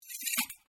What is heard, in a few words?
Piggy Repair